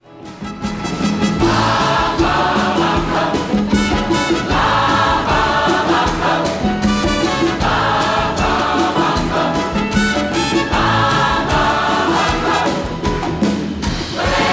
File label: Latin Hit Medley